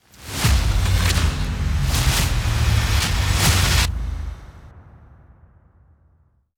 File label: Ramp Up; dawn of the monsters; Cairo